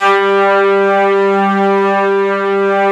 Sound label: ST ORG, STRINGORGAN, Yamaha VP-1; Music Store; 90's Sample CDs